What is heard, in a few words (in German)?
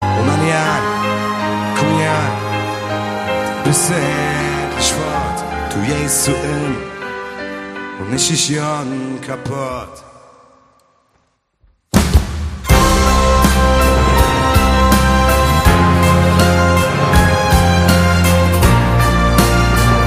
live mit orcherster